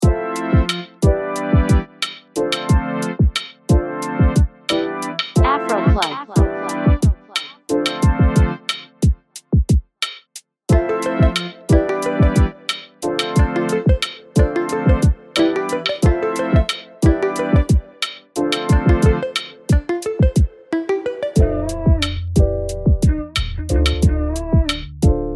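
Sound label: afro keys R&B; afro keyz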